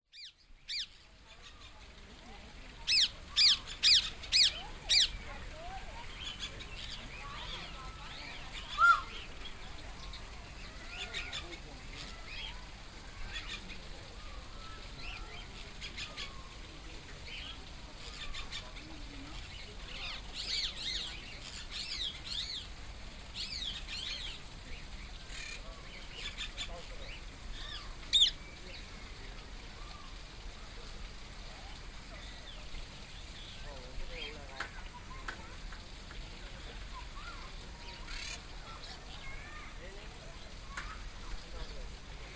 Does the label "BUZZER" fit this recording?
No